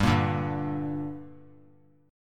G Minor G down, chord; strummed; JGuitar

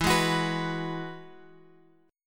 E Major Add 9th, Guitar Open F; strummed

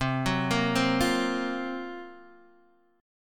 Gb Major 7th Flat 5th

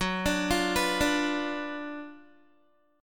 Gb Major 7th Suspended 4th Sharp 5th, Guitar

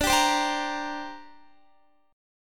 D 7th Suspended 4th Sharp 5th D Guitar; strummed; x,17,17,17,19,17; 4E,4A,5D,5G,5C,6F; chord

